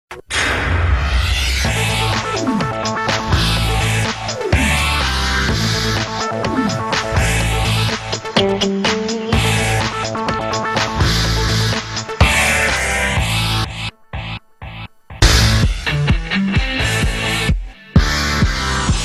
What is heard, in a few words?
theme tune; Eurosport